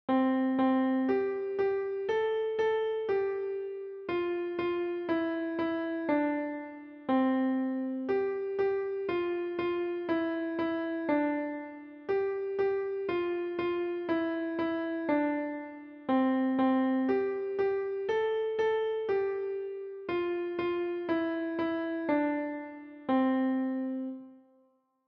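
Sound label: piano, für Klavier, sehr leicht; deutsches Weihnachtslied für Klavier, sehr leicht; Morgen kommt der Weihnachtsmann - für Klavier, sehr leicht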